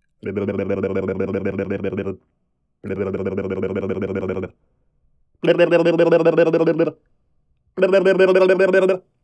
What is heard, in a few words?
Cartoon Taunt Tongue And Lips